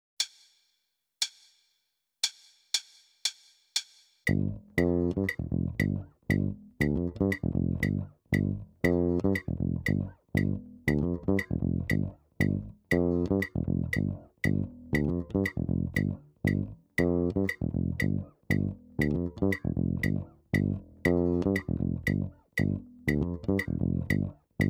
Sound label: Rock Funk Rock In 1/8